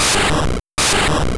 Wrap, Normal Attacks; Added Wrap & Whirlpool Animations; P3D-Legacy

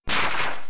thunderbolt, Battle Effects; P3D-Legacy

